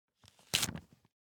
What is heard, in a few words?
Open An Envelope; LOOPERS